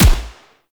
Assault Rifle Fire Initial; weapons; Warframe; Grineer

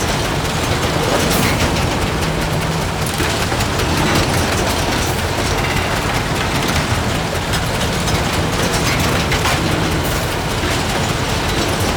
Tank Engine Growling Loop; Enemies; Movement; Lasrian Tank; Warframe

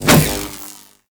Prod Whoosh; Warframe; The New War; Drifter

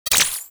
Blastor Pistol Low Clip Mech; Warframe; Weapons; Corpus

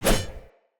Spear Whoosh; Weapons; Cleansed Spear

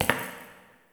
Idle Single Step; Tagfer; Warframe; Ambience; Entrati

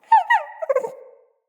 Wolf Cub Trapped Call Close; Animals; Wolf Cubs; Orengal Wolf Cub B

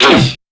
Pain Crewmen; Warframe; CorpusCrewmen; CrewmanA

